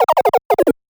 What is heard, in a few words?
1up; Radirgy 2